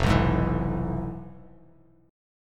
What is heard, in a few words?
F Minor Major 7th Flat 5th F Bass Standard, chord; FmM7b5 strummed; JGuitar